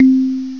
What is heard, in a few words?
kalimba c4; pokeymax; self test